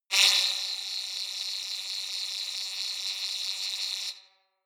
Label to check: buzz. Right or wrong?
Right